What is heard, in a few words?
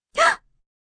Gasp Short, Gasp, Breath, 1 child, quick, surprised, high-pitched; human voice, kid; Gasping Sound Effects